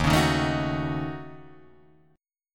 D sharp Minor 7th Flat 5th D sharp down Guitar Drop D